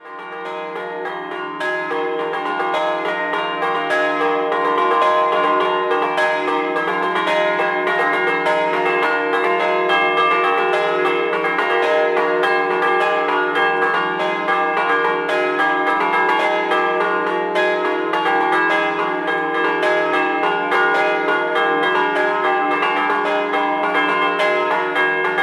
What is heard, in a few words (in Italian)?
COLUGNA, campane